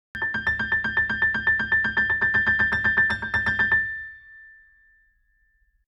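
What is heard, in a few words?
Piano Noise, ringtones; Motorola Moto G20; lenovo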